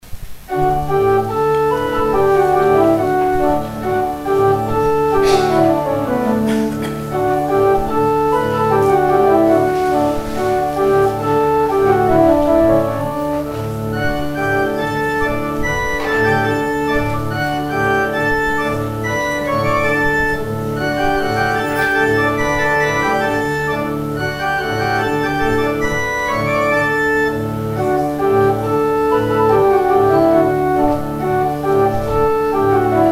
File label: organ interlude; Organ Interlude during the offering